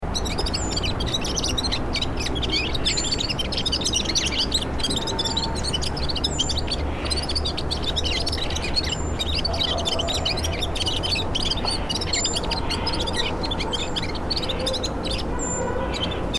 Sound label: Red fronted Serin Serinus pusillus